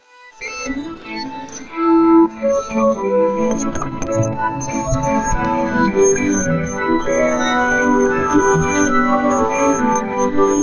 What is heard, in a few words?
music tightens briefly